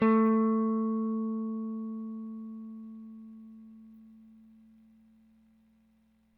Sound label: Rick, Rickenbacker Bass; Airwindows